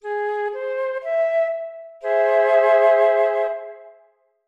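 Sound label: treble triad augmented Ab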